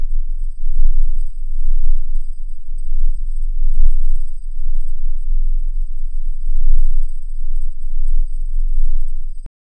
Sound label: tension loop a low hum whi; sheath